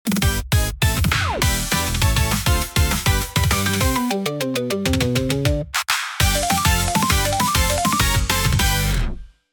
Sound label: patto kirikae long, jingles; free jingles